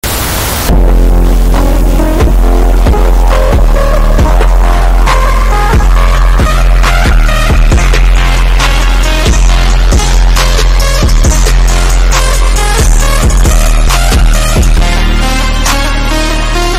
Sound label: EXTREME EARRAPE LOUDEST; Howard the Alien